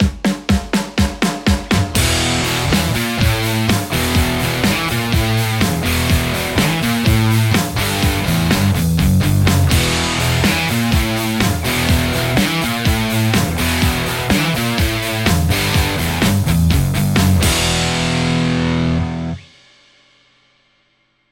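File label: Telecaster; mercuriall harlequin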